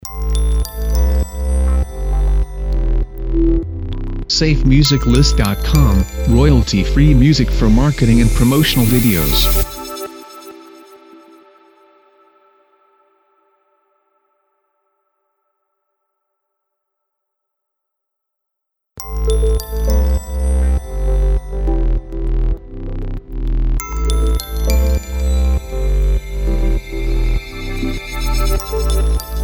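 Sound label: Electro Ambient Dream Intros; SafeMusicList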